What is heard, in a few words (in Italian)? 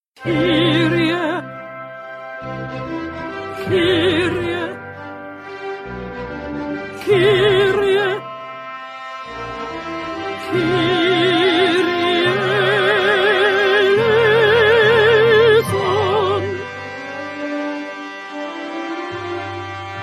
alti kirie